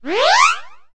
Heal; Treasure of Nadia

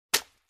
wood crack; The Witcher Hunt

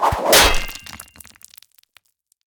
Grapple; Treasure of Nadia

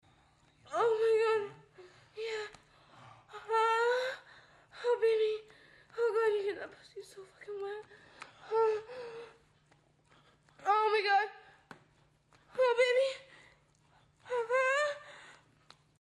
sex, moans; The Witcher Hunt

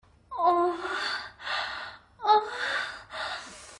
sex; Low Blow

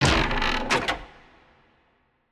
Chest; Treasure of Nadia

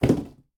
Drop; Treasure of Nadia

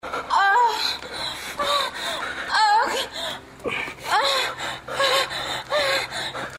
sex; Low Blow

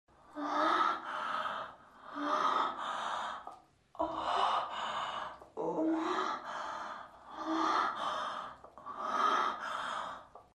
moans; The Witcher Hunt

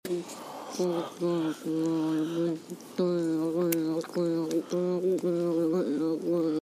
suck, moans; The Witcher Hunt

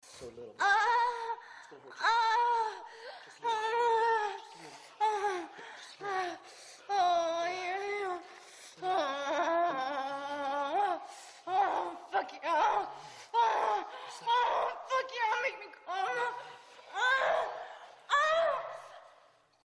sex, moans; The Witcher Hunt

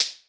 Smack; Treasure of Nadia

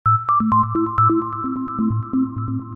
detector; Low Blow

